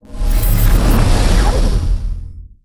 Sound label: sfx titan moving out; Stellaris; nemesis